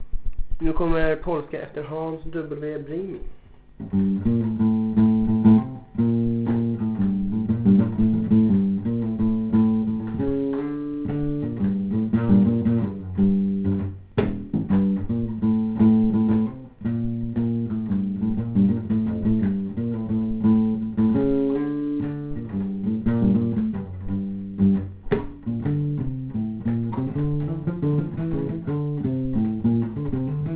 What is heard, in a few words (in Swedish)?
Polska; e Brimi